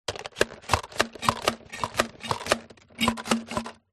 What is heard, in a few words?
leisure toy water gun pump action plastic dry fire